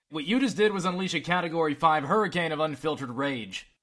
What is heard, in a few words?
unfiltered rage